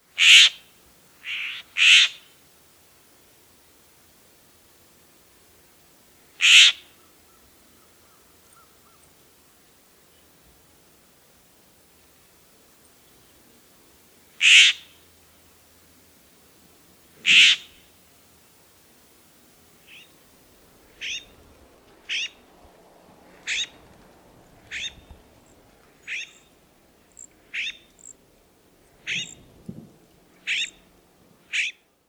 Florida Scrub Jay Typical weep calls and scold calls